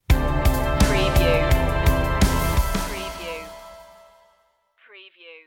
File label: pressured stinger